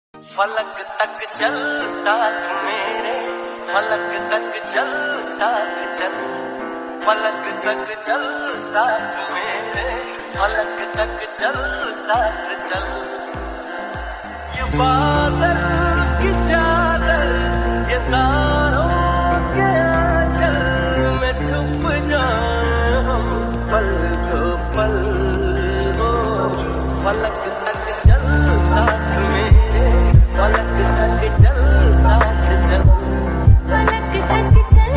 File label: lofi hindi ringtone; tashan